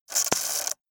technology camera compact take picture flash no film; pentax espio 105g; zapsplat